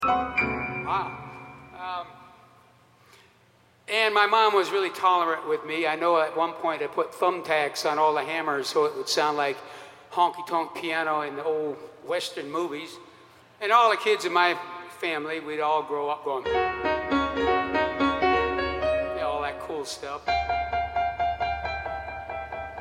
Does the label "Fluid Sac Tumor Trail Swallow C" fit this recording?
No